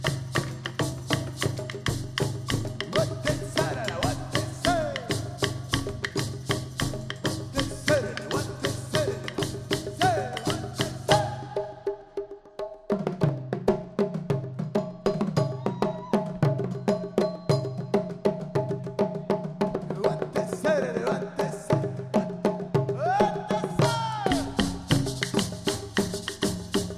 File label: drum Song; Arabic; World Music